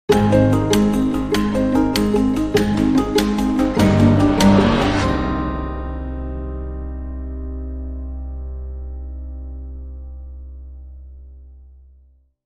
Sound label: Short Sting